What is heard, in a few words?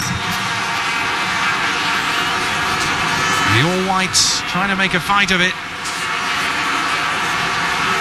vuvuzela